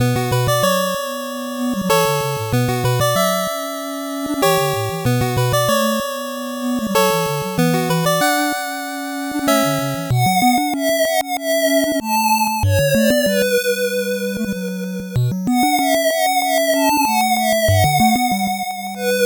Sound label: 8bit bgm normal